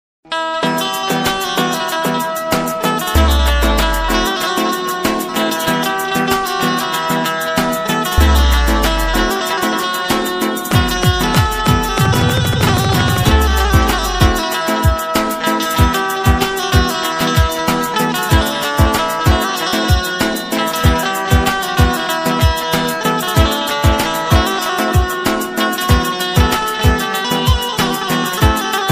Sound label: gujarati instrumental ringtone